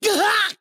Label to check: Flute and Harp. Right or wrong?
Wrong